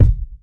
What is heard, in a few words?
studio kick a sharp key, bass drum tuned to the A# note. Loudest frequency: 98Hz; kick drum samples